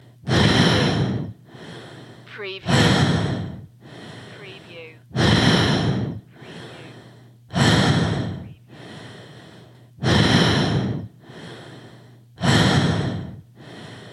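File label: Female Heavy Slow Breath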